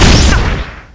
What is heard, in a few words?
Weapon Spear Hit